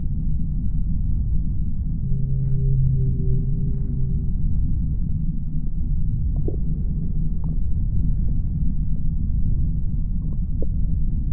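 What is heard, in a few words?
voidspawn idle loop; Stellaris; reanimated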